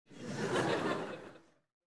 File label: Light Entertainment Audience, Audiences Crowds; Children Crowds Foots; BBC Sound Effects Library